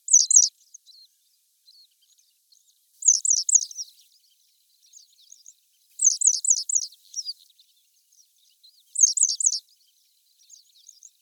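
zheltolobaya tryasoguzka golos, yellow-headed wagtail voice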